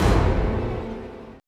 AMBI FX

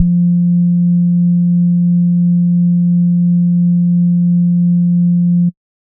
SBASS F2, Sub Bass Sine; neuro; Stanza

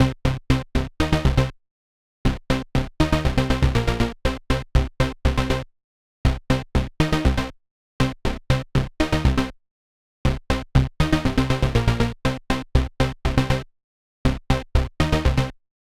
Progressive Synth, Trance

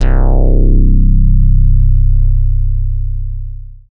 dance bass; Sound & Vision - Gigapack II CD 1

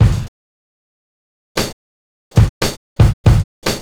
Drums; Sonic Foundry (Sony Creative Software); Stylus Pressure Urban Grooves on Digital Wax

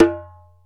Ashiko Go; Planet Earth; Digital Sound Factory Planet Earth

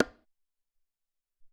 Cong Hi

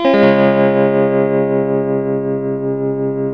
TELTMUMAJ D, TELE CHORDS, Guitars; Spectrasonics; Hans Zimmer Guitars VOL-1

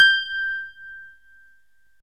ELEC GRAND; OMI - Universe of Sounds

